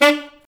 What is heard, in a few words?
SAX A. STAB, Alto Section; Alto Sect FX; Roland L-CD702